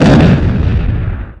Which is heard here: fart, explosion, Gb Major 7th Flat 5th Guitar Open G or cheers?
explosion